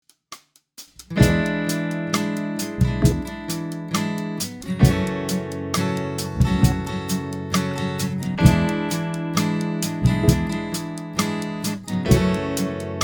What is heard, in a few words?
E7 to F sharp m